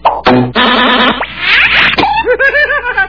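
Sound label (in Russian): разнообразные звуки шума в мультиках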